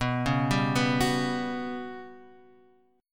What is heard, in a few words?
G Major 7th Flat 5th B